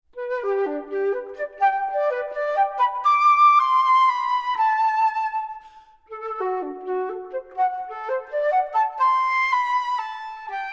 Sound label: performance Flute; Gariboldi; ABRSM